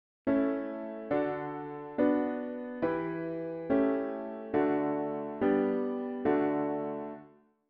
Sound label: root V7 bass